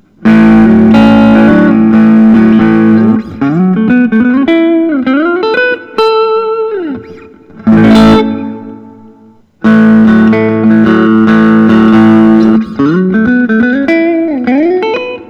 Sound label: 2006 scott walker special bronze patina